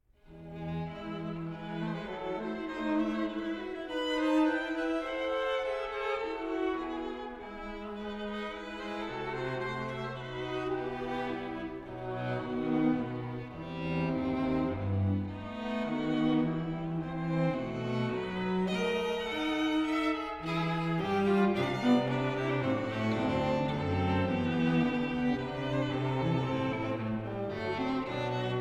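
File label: String Trio; Wheelock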